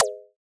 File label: Alien FM Perc Short